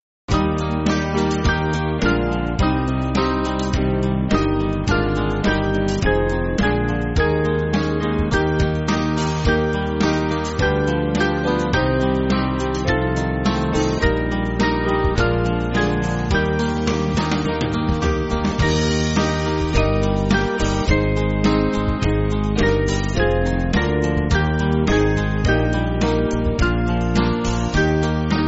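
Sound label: Band, Music Backing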